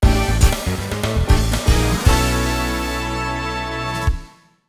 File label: Race Finish; Gran Turismo Japanese Edition; PS1